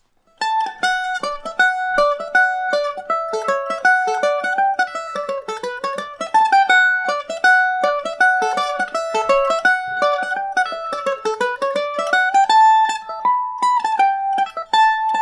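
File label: fairy reel d major revisited, mandolin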